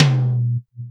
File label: Yamaha CS6, Drums